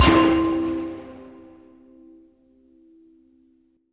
Mission Goal Complete; Futuregrind

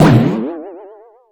Belly Bounce Timpani

